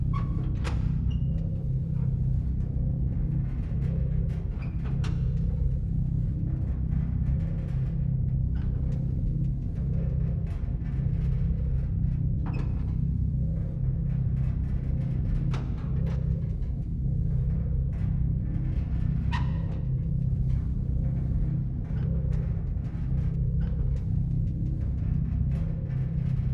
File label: ark ship idle; Stellaris; other entities